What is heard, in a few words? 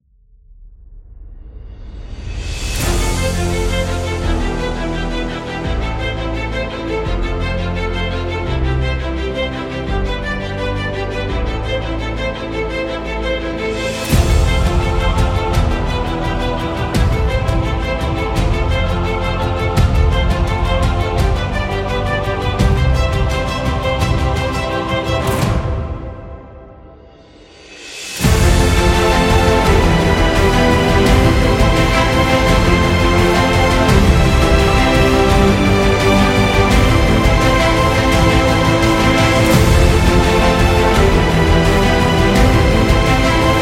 Awards Trailer